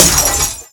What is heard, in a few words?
Light Bulb